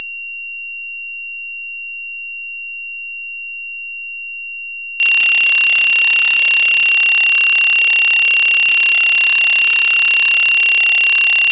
pic to aud

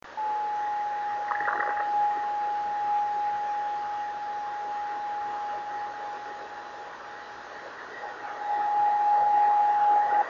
26605KHz, driftnet beacons

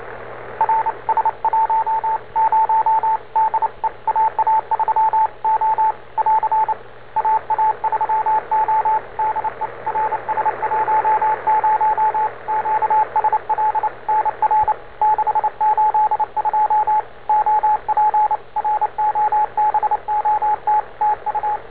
rs10 robot

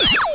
laser

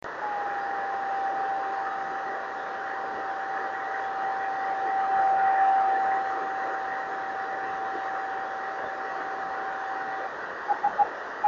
drift net beacons; 26.850

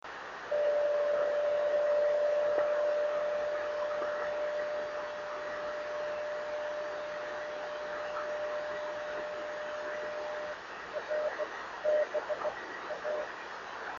26530KHz, beacons; driftnet beacons

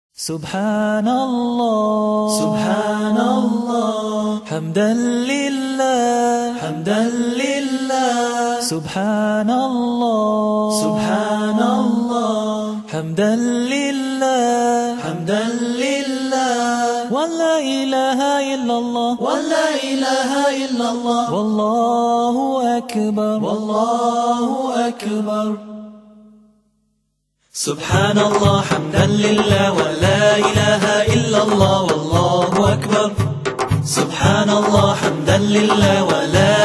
subhanallah

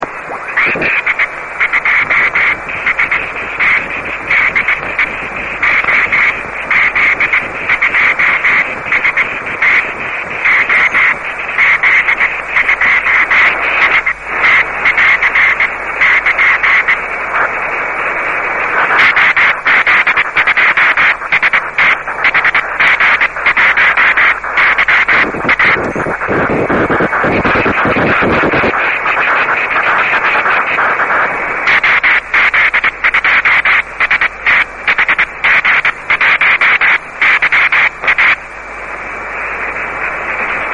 Aurora propagation on 144 MHz, cw; VHF; OZ8SL

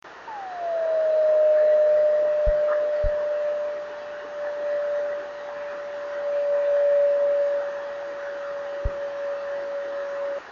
26670KHz, drift net beacons

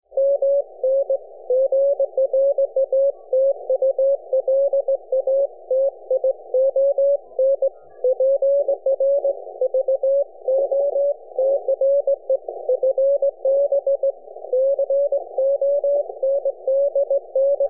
chirp

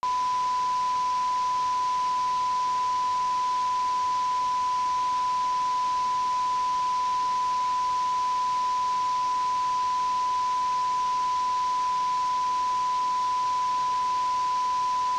16dB SINAD; RF Transistor data

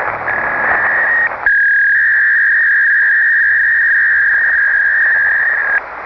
pack 300, PACKET 300 Baudot

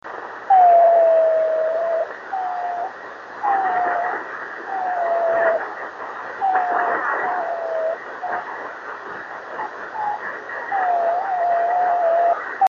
dying Driftnet 26595 KHz, driftnet beacons; unreadable

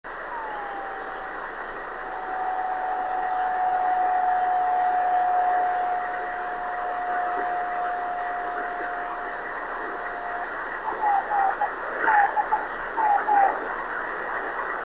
26895KHz

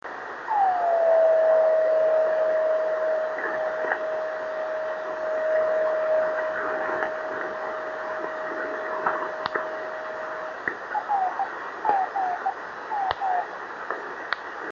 driftnet beacons; 26.510

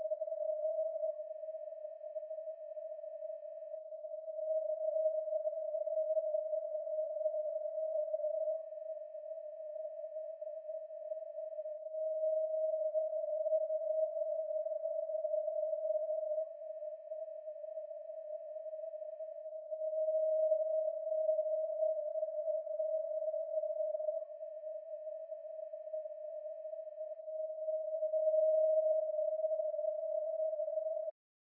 horn to dish filtered

